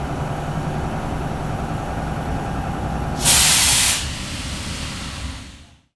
Cp End, Train; FCMB 2000; OpenBVE

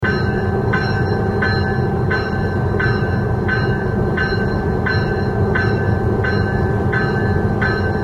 klaxon, Train; Siemens ES64U2

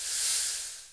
Air, class 66 train; OpenBVE

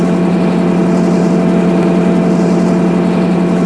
Motor, Train; M61 Halbi

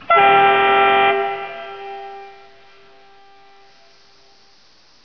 klaxon, Train; OpenBVE

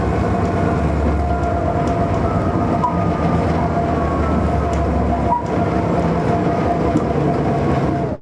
Rev down, class 66 train; OpenBVE

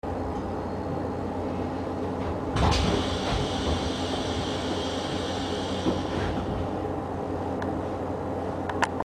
Door Open, Train; RBDe560

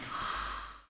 Air, Train; OpenBVE

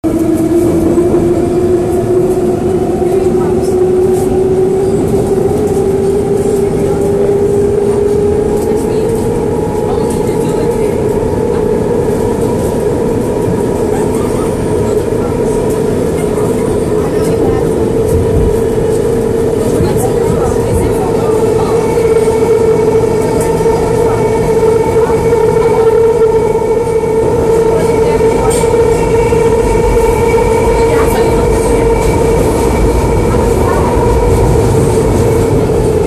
RUN, Train, R62 Kawasaki; OpenBVE

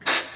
Air, Train; OpenBVE; loose stock

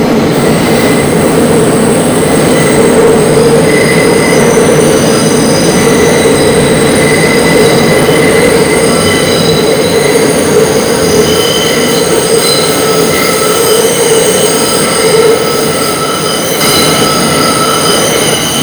flange, Train; OpenBVE; Jerome Yard

